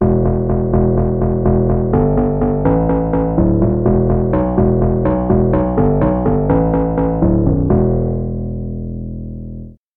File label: koto bass